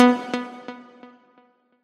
combo; quatuor

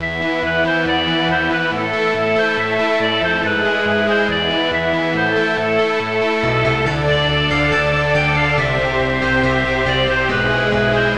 Song A without pizzicato; TETRIS3000

